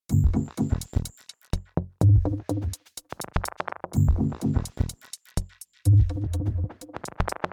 Minimal Shuffle B 125

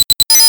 Blip

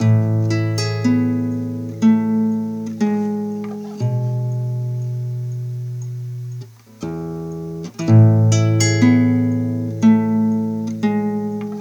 Guitar

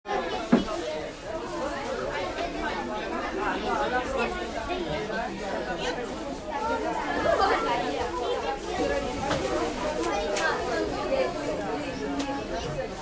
School Class Sounds

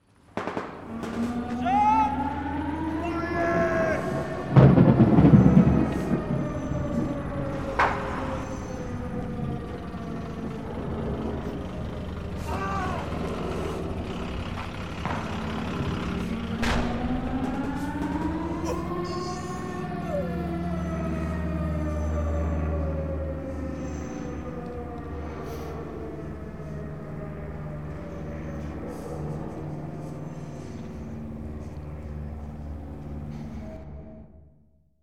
War Scene Arrangement

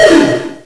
Gauss

